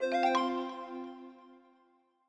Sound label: Notify Calendar; Longhorn Valley Sound Scheme by David Mounder